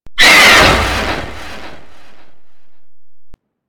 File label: Golem Pain; Warframe